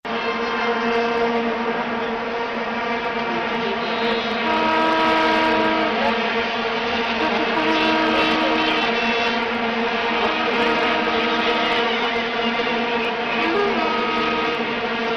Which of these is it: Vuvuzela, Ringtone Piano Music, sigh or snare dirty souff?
Vuvuzela